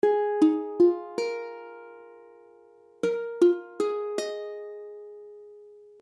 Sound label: Bb7sus4, ukulele